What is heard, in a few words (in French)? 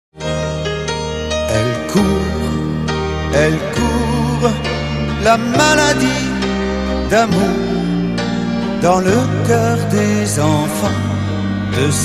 Chorale